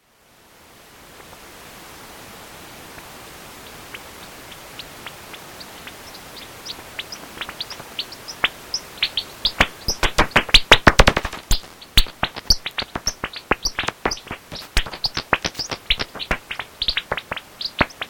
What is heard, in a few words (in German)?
Fledermaus